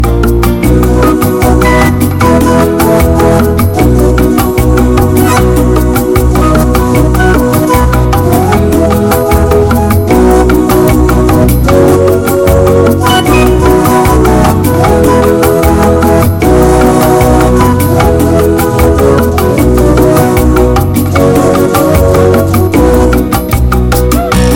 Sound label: Fast Pop Bossa